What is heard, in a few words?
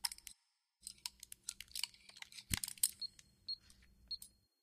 gadget; Secret Agent